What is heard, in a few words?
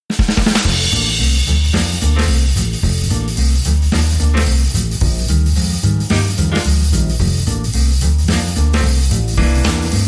Rhythm Only